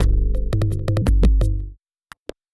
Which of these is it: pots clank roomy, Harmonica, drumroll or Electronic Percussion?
Electronic Percussion